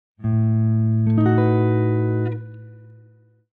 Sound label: B7 over A, chord voicing, guitar; triad substitution; B7/A